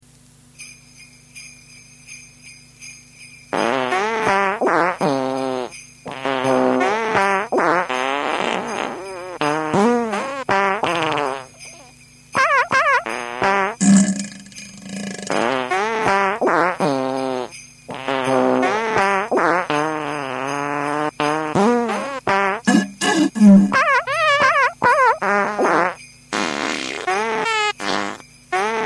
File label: jingle